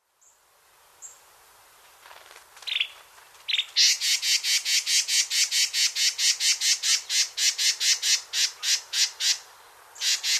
Carolina Wren Thryothorus ludovicianus

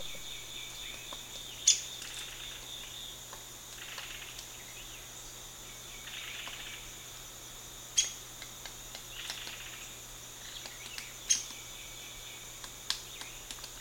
Downy Woodpecker Dryobates pubescens

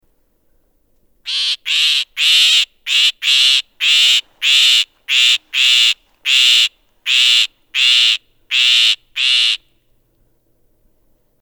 Northern Shrike Lanius borealis invictus

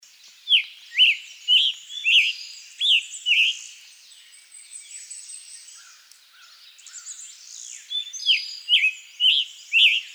Scarlet Tanager Piranga olivacea

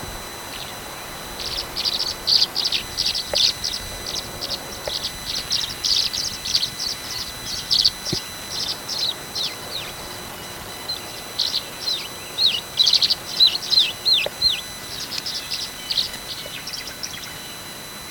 Tree Swallow Tachycineta bicolor

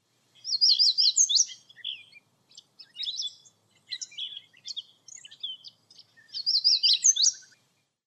American Yellow Warbler Setophaga aestiva

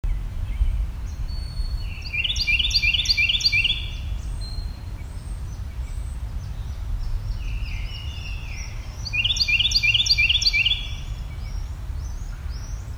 Carolina Wren Thryothorus ludovicianus

